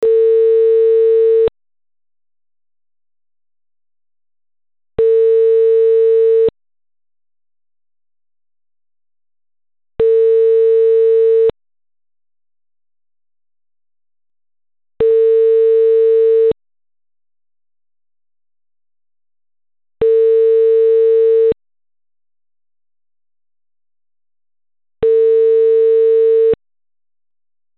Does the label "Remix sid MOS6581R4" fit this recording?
No